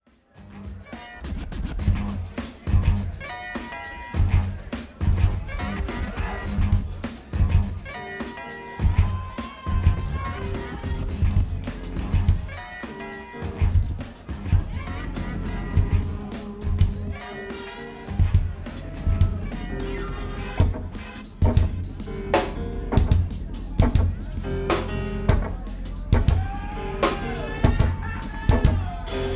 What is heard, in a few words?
Jazz Odyssey, improv, jazz; The Bluebird Nightclub Bloomington IN USA